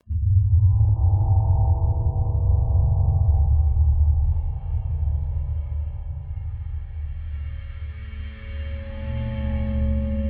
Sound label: ATMOS dark; the 39 steps